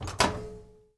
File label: Panel Wifi Open; Among Us